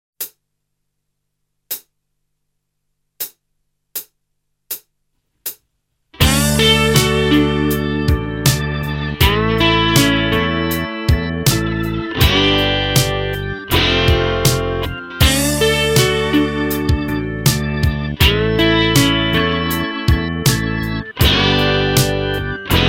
Slide Guitar

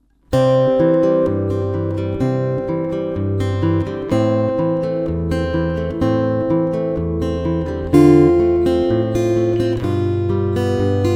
Fingerpicking, guitar

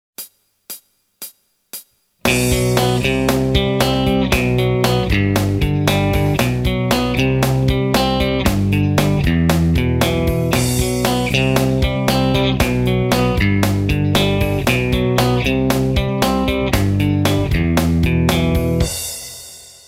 Arpeggios, guitar

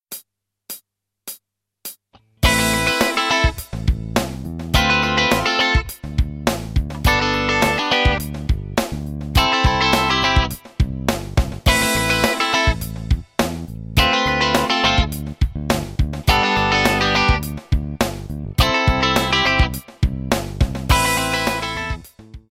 Rhythm Styles, guitar

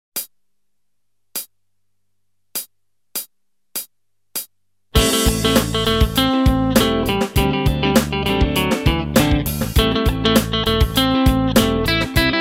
Dorian Model Scale, guitar

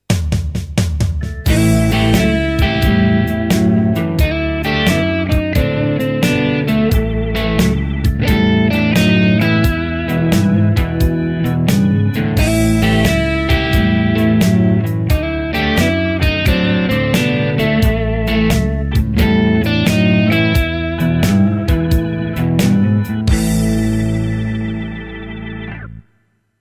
Blues Scales

